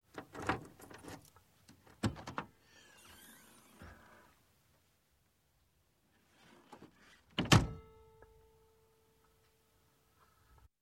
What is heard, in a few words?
Golf GTI Hatchback; Sound Ideas; Series 2000